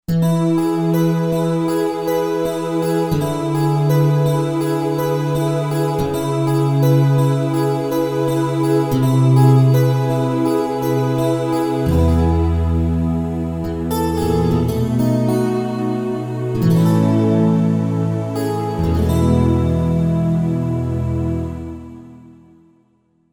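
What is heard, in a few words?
Heavenly Guitar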